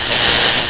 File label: elec fnc; Total Chaos Nukem 3D Pack; duke